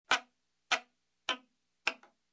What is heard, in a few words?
Tightening a screw on wood